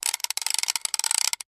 Fishing reel being reeled in at medium and fast speeds, close up.; Reel, Fishing Click, Fishing Reel Ratchet, Fishing Reel